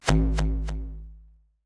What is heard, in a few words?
roll, dice; There; ThereClient